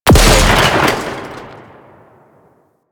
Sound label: Drone Explosion; Warframe; Venus; Venus Drones; Venus Drone Death Explosion